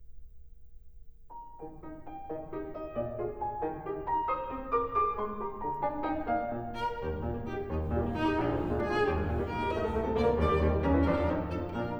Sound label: Variations for cello & piano in E flat major